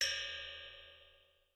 通用发现音效

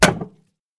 剁肉 正常; 第二章

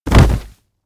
书店内 主角摔倒

